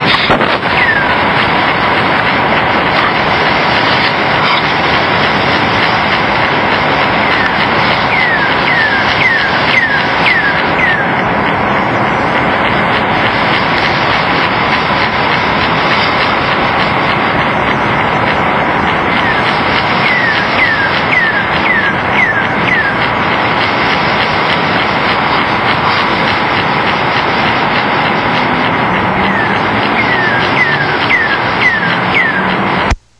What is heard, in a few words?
bird; FAKED; bird calls, obviously electronic when heard live, which "follow" about one target in three as they go about their daily business; Audio types, used by the perpetrators